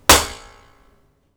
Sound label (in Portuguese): Parede